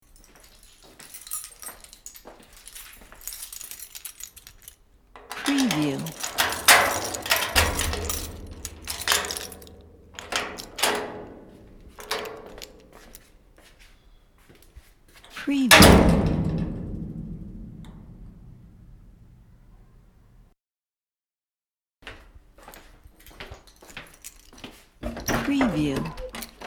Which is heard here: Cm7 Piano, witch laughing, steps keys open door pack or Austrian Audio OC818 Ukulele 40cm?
steps keys open door pack